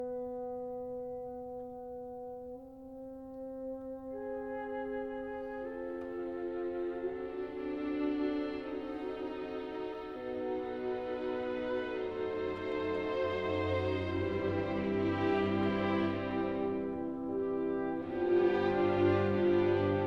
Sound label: Violin Concerto, Andante; Felix Mendelssohn